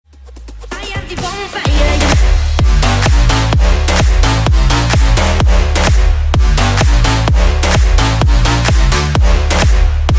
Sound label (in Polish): muzyka